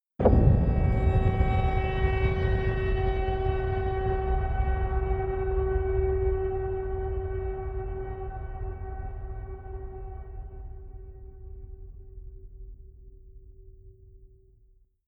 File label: sub explosion ring, impacts; hits explosionen